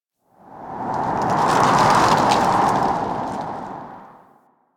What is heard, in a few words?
Tower Rustling Leaves; Ambience; Warframe; Quests; The New War; Cetus; Unum Tower